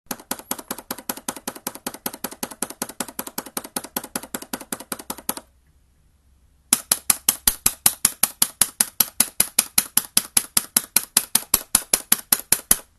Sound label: button